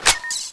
plasma clip in; zm boost; privilege; boss; plasmaex

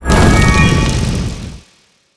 winggunex special on; zmall; zm boost; privilege; mboss

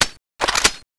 fly; thanatos3

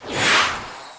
cannonex dragonfx idle; zmall

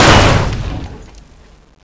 storm prepare, scorpion

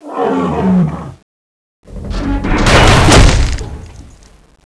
tentacle, scorpion

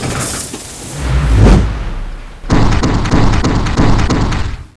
attack, scorpion

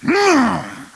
attack blitz; Illidan

